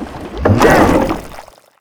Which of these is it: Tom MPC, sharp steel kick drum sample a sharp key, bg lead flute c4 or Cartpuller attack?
Cartpuller attack